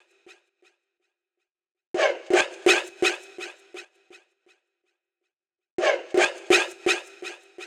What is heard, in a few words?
Fx Prc Loop 125; Equipped Music; Loungin House; Perc Loops 125